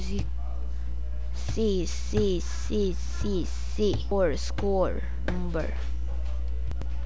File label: sea